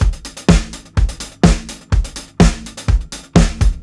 125 4 FLOOR 16THS, DRUMS LOOPS; BASEMENT DRUMS VOL 2

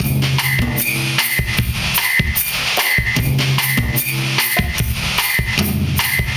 Brushes at 150 Hashy Pumper

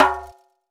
Djembe Rim; Roland BK-5; Percussion; Afro

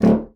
bassoon D1; Xsample VOL-6; C BASSOON

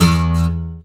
GUI Ac. E2, GUIT AC; Best Service Gigapack 2

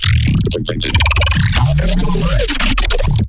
glitch stab; Virtual Riot; Glitch Stabs

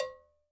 COW LIP, Percussion; Sampleheads; NYC Percussionworks; ST PERC VOL1

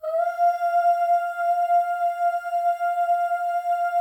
FEM OOS, Symphony of Voices

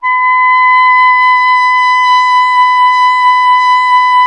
clarinet B; Miroslav Vitous; Solo Instruments VOL-1

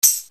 LINN TAMB

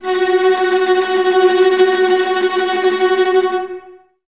VLS F# Tremolo, Violas, String Ensembles; Miroslav Vitous

